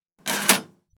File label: Cash register